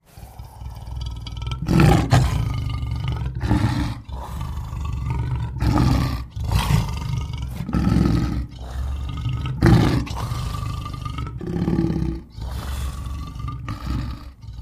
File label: Lion Growl Massive; big cats